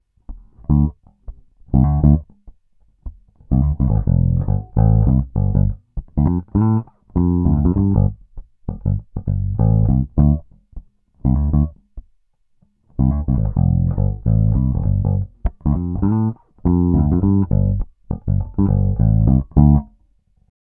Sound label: Peavey Stock Bridge Fingerstyle Tone DOWN; Peavey based pickup Shootout